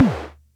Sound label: Music Percussion Computer Tom, Drum Machines